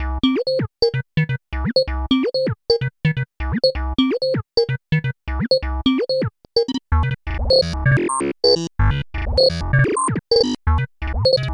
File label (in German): roland tb-3 sequenz mit scatter-effekten